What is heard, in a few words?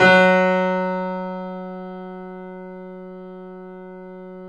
F#, The Piano; InVision Interactive - The Piano